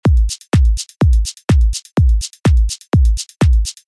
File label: groove